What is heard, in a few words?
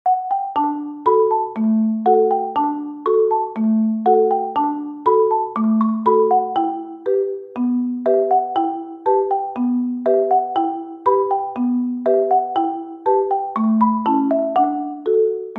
marimba